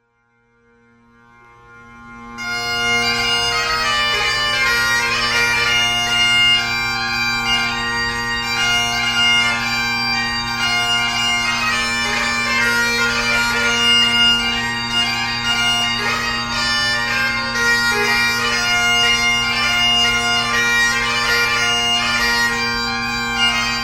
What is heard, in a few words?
bagpipe selection, holophonic music; Holophonic Music and Sound Effects